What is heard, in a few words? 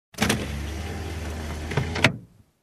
Electric Window Lift